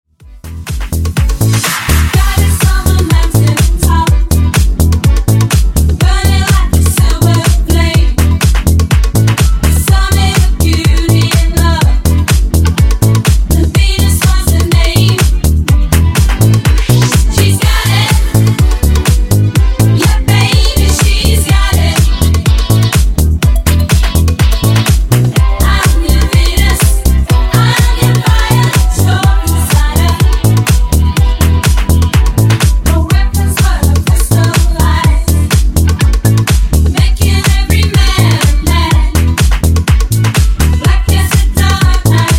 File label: Funk Remix Clean 124 Bpm